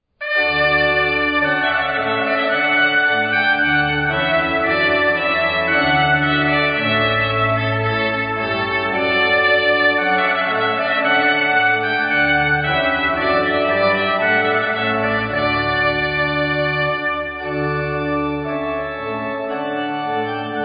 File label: Trumpet Voluntary